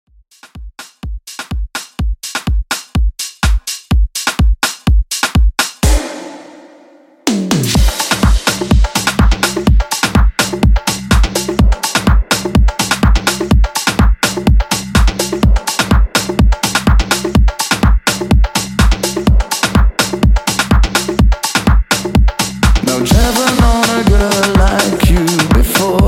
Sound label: epic dance house 125 bpm